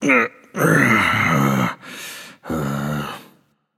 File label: ugh; smite; ares